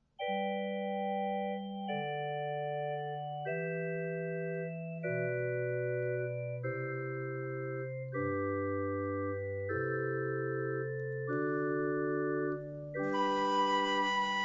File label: Canon; Pachelbel; repertorio para bodas